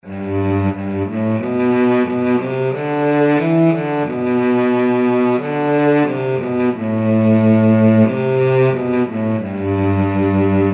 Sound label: cello; long long ago